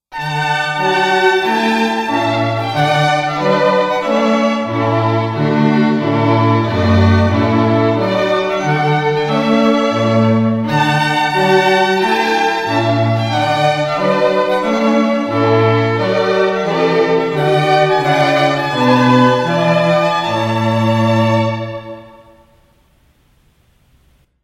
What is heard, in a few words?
trumpet tune